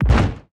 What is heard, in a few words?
damage hits explosions